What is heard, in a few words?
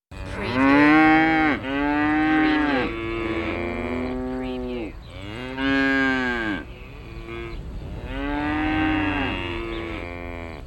Cows Country Chillin